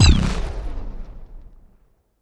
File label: energyshield down; Machines